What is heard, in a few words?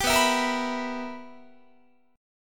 B Major 7th Suspended 4th Sharp 5th Guitar, chord; strummed